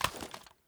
foley cloth draw, weapons

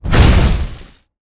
foot

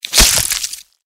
sting attack; npc; cazadore

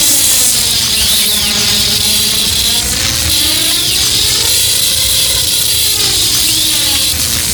lightning loop

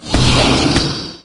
ceiling attack, wraith; doom3

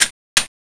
clip in; tfa cso; python

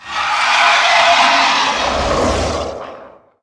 facehugger dying

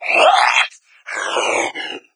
sight, hazmat zombie; doom3

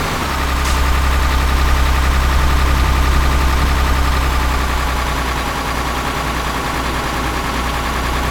fourth cruise, vehicles cars; truck actros; lwcars

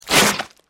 arm swing; npc; sporecarrier

